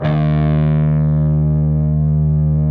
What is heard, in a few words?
PRS E, Guitars, LEAD; Spectrasonics; Hans Zimmer Guitars VOL-1